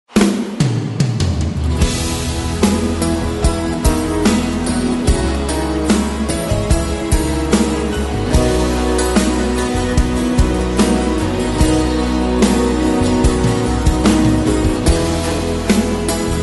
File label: Acoustic